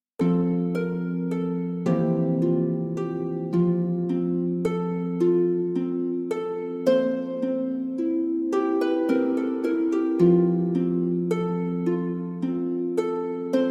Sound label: Lullaby (Harp)